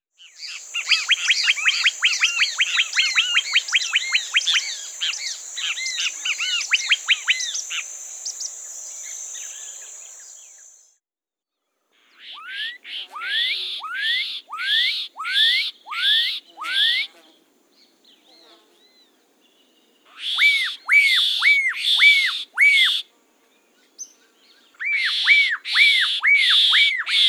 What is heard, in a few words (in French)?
Donacobe à miroir